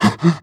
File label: breath short, zombie